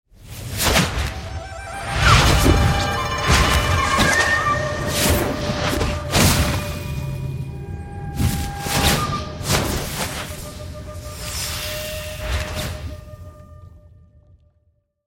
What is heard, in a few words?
Traditional Chinese Musical Instruments; 中国民乐